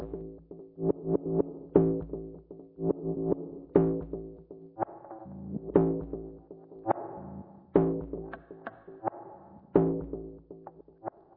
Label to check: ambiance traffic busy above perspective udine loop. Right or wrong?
Wrong